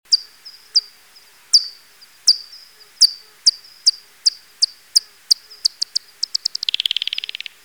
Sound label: buzz